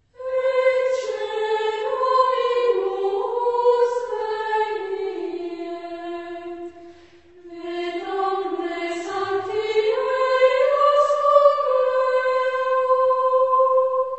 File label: Gregorian; Gregorian Seasons; Medieval Music